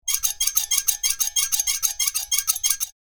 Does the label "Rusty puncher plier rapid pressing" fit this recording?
Yes